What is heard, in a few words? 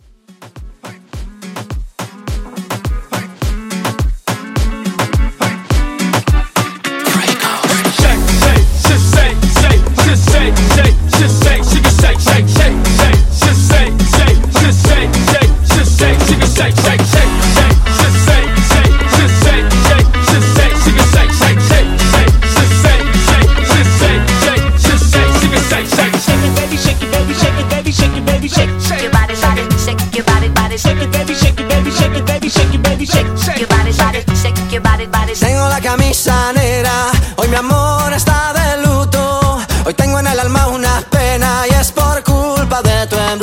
Latin Moomba Hype 105; Fresh Coast